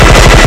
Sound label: minigun